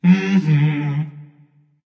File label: tounge; smite; voicepack; janus